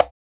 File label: POPCGA MUTE, congas; Drums In Your FACE!